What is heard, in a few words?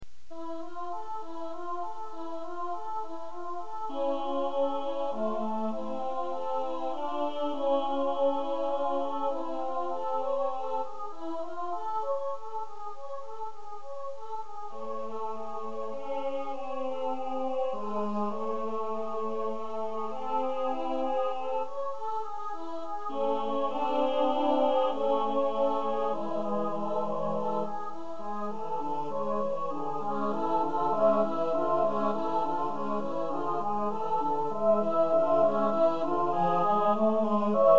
full instrumental